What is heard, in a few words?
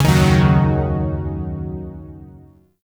Shawzin Yo Chord D; Warframe; Zariman Shawzin; Chords